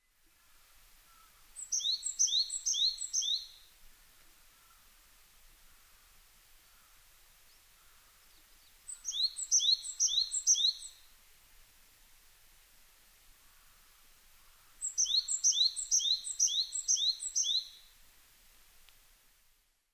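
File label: higara, coal tit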